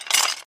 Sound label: quick stop; lost relics